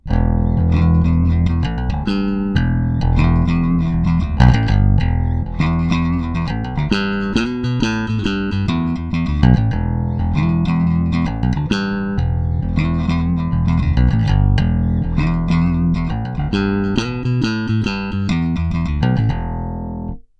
riff di

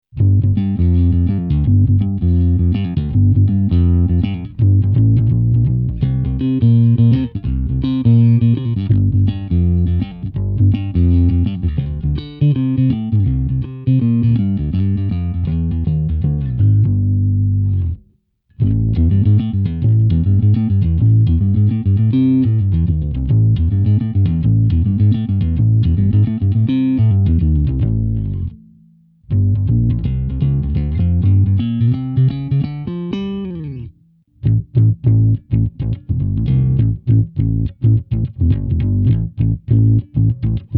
superbass, amps; zoom b3

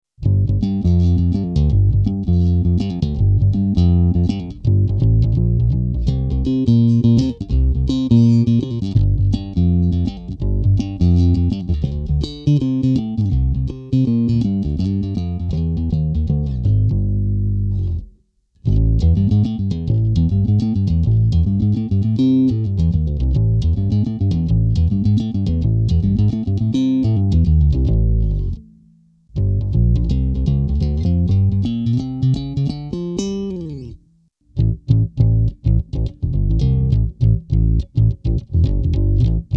aguilar, amps; zoom b3

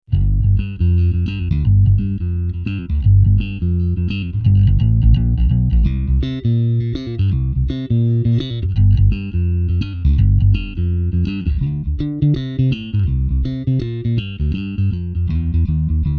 svt, amps; zoom b3

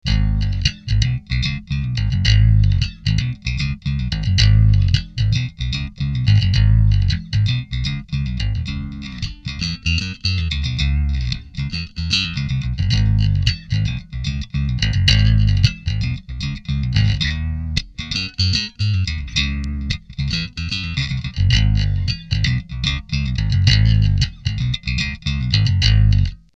riff

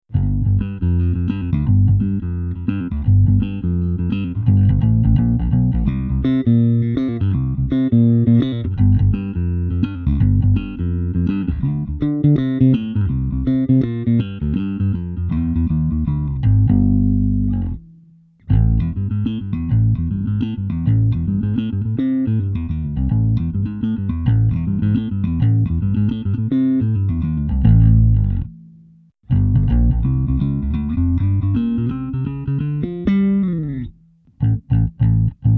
polytone, amps; zoom b3

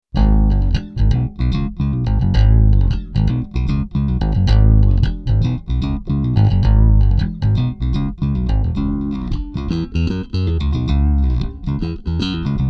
riff svt pre

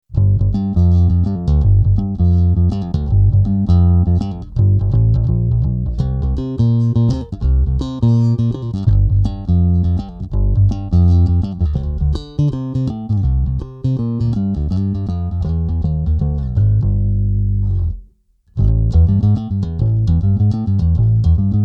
markbass; zoom b3

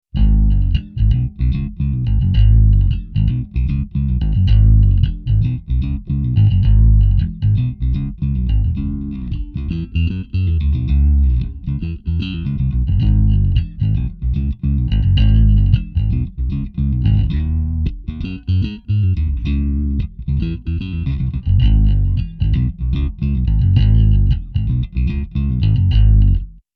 riff svt cab

